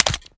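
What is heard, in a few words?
pistol reload empty fast mags large mag out; gmod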